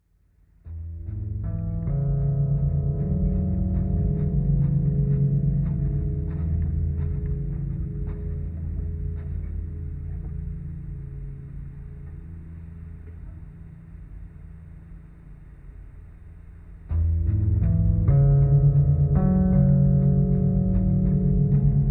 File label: five pianos